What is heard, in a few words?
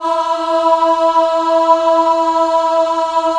E3 WOM AH, Choir; WOMEN; USB Soundscan vol.28; Acoustic & Synth; AKAI